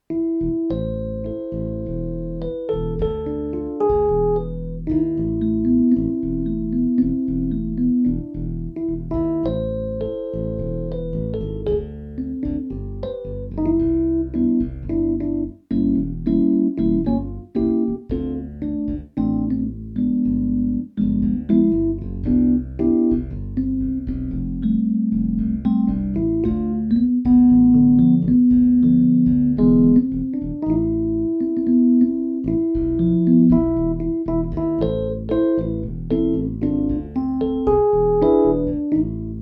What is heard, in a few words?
Bossa